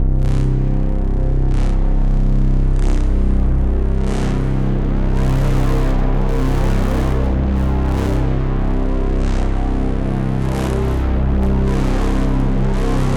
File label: Ominous Pulse - Cmin 140 bpm; MIDI Clips; Tonal; Atmospheric; Ableton; Factory Packs; Mood Reel